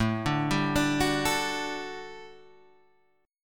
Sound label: G Major 7th Suspended 2nd A